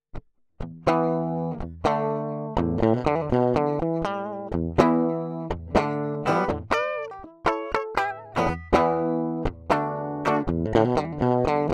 junior rock

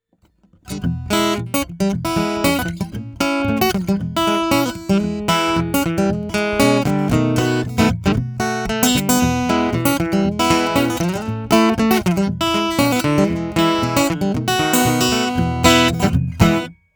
larrivee country

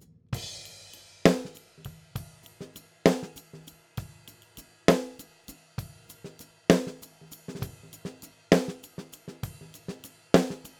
snare

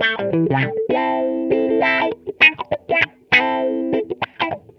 guit original

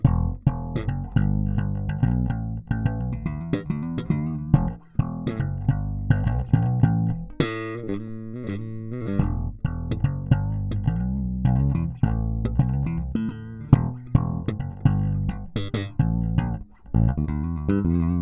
jazzbass slap

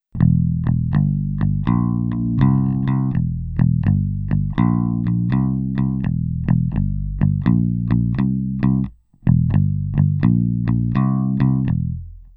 mediator clean

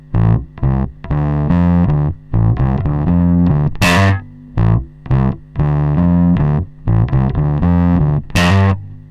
hi

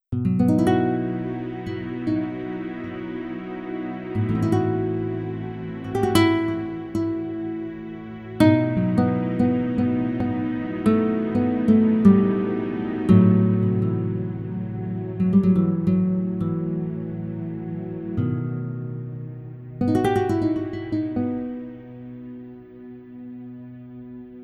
flamenco

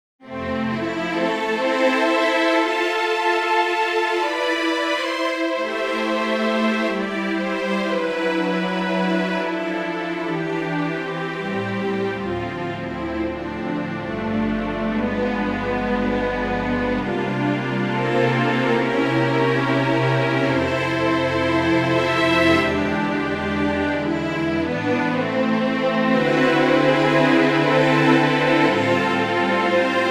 sympho strings

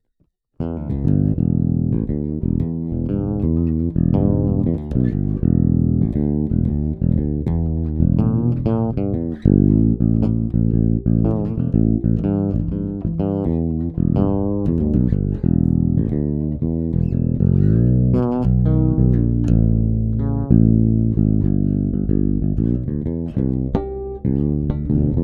blues

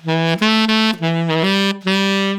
sax original

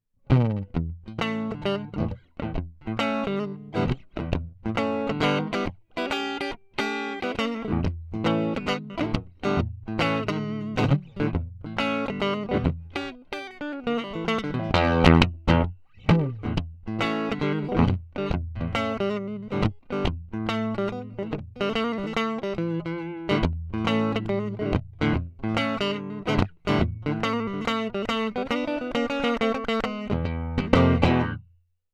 strat blues

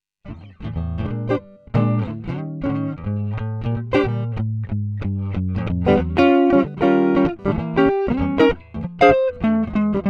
lp custom jazz

